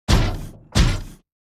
Walk

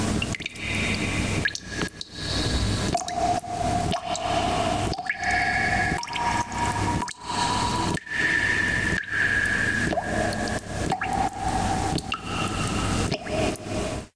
Water Drop

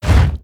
Monster Generic Foley Movement Fast

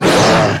Monster Attack; Monster George Attack

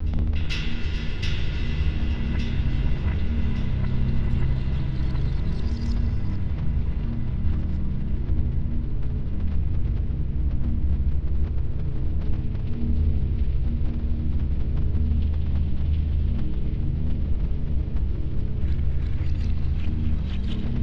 Music Sacrifice Room Ambiance

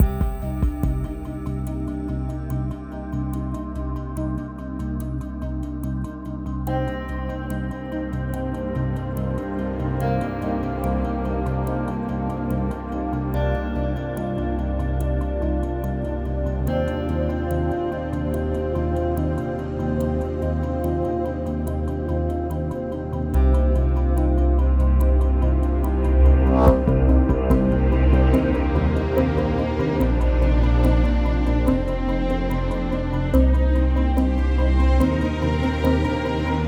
Music Loading 72bpm Game Mix; Forza Motorsport 6 Apex

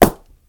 Arrowstorm

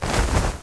Walk; Scolar Walk; Knight Online

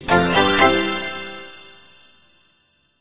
In Clear Result

